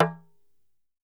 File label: Talking Drum - E; percussion; Cymatics - Infinity Beta Pack 2.0; Zeus Percussion Collection